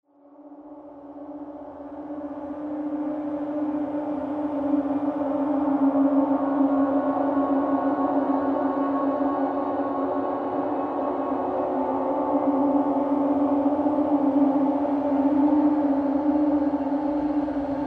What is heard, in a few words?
atmosphere vapour